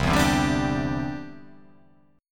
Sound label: C-Major Add 9th